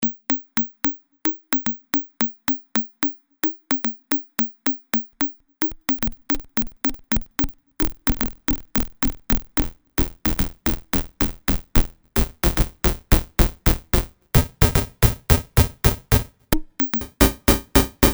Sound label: LFO to Envelope Depth